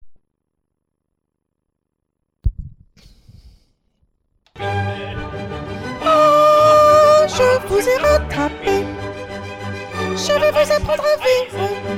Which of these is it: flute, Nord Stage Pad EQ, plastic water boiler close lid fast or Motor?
flute